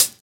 Juicy High-Hat Sample E Key, Royality free hihat sample tuned to the E note